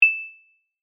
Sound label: smooth Ding